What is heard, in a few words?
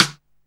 Sidestick, Alesis Hr16, Drums